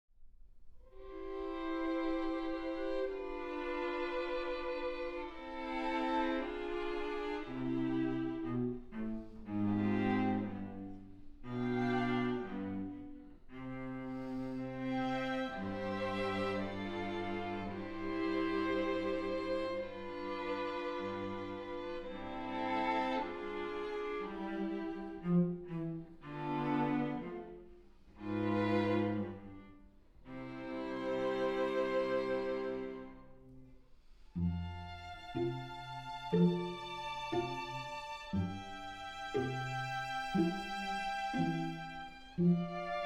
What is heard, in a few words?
without guitar